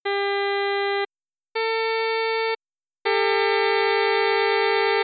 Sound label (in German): Ganzton 12 EDO